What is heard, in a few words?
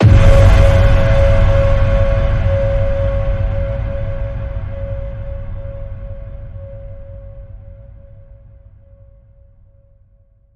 Ping Pong, C sharp